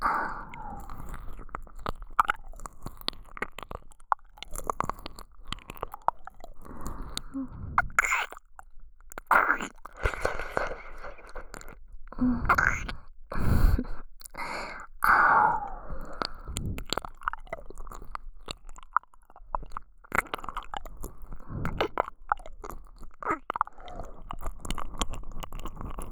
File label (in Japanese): 左耳舐めループ